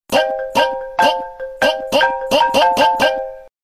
gulp gulp gulp

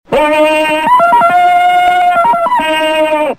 yell ringtone; tarzans

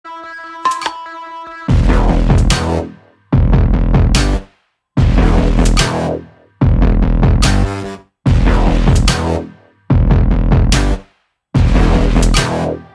Sweep Ringtone; Naiad

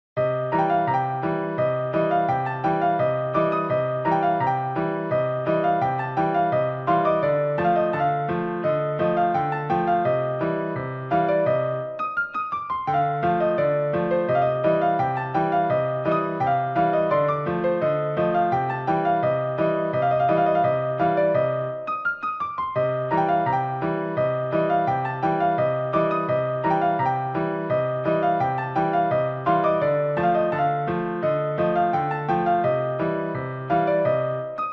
Arabic Nokia Ringtone, piano

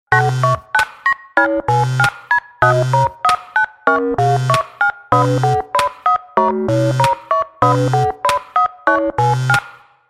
canon ringtone; pachelbels canon

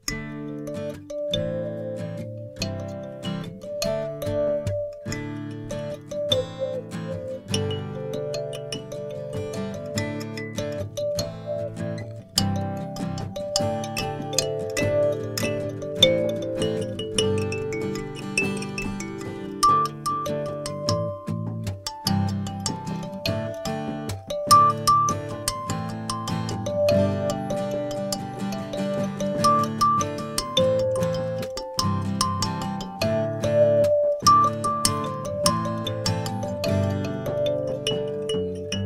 treble kalimba with evil tuning

